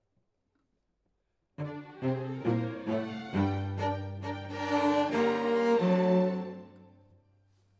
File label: Cello Solo